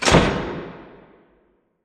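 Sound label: Assembly Plate Lift Impact Rock Distant; Boomtown; Titanfall 2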